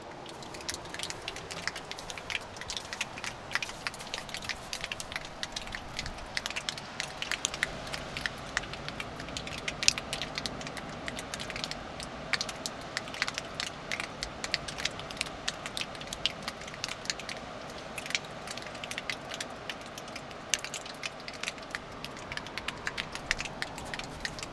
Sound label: Drops; AUSTRALIA; Les Cartes Postales Sonores; NOISE ON EARTH Vol7